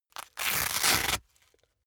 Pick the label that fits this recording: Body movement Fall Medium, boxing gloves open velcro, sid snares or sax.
boxing gloves open velcro